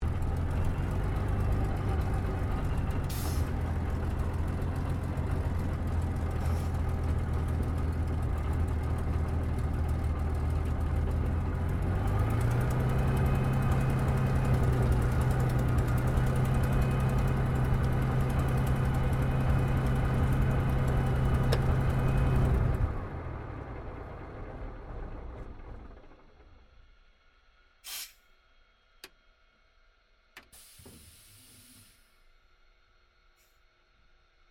AIRCRAFT SUPERMARINE SPITFIRE MK-VIII SHUTDOWN INTERIOR; Airplanes; Airplane Sound Effects